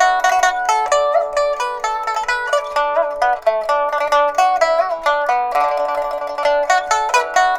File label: PIPA, 90; INIS - Opium